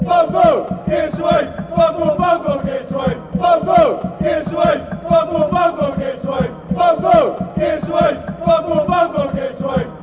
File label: give us wave, fanchants; bumble